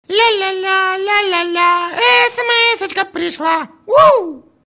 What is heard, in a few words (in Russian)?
sms prishla